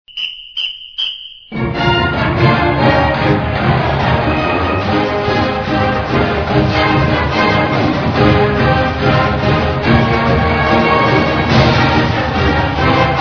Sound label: victory song